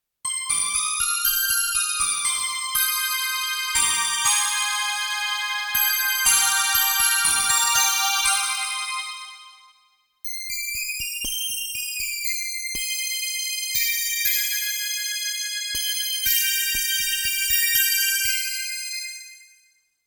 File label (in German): Aliazing Yamaha TX816